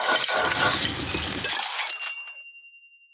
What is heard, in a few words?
cooking sequence